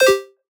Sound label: Retro FX; Cymatics; Video Game Sample Pack Vol 1